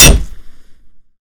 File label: crowbar hit; melee